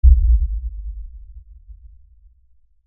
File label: LFE Tremor, Lfe Tremor Low Bass Shake Rumble; Blastwave; Sound Design